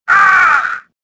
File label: death, swamp; swamp2; Star Wars Jedi Knight II Jedi Outcast; XBOX